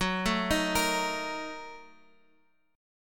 A sharp Major 7th Suspended 4th Sharp 5th, guitar

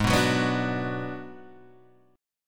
Ab Minor 7th Flat 5th Ab, down, Guitar, Standard; strummed; JGuitar

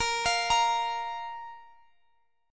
A sharp 5th chord; x,x,x,x,x,17,19,19; down

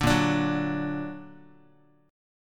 A sharp Augmented Guitar; A#+ strummed; JGuitar

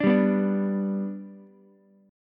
G Major G down Ukulele; G strummed; JGuitar

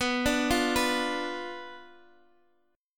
B Diminished B down Guitar Half Step Down

